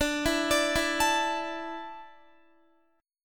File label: D Suspended 2nd D, down, Guitar; Dsus2; arpeggiated